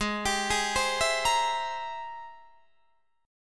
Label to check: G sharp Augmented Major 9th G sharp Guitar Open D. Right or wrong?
Right